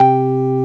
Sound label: organ, M1 organ; Best Service ProSamples vol.10; House; AKAI